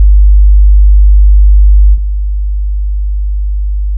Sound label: soundproof effect 50hz; ノイズクリアの防音効果